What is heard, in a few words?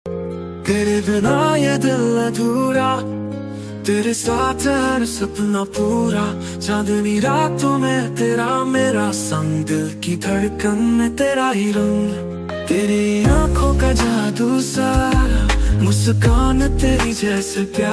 hindi ringtone love ringtone romantic ringtone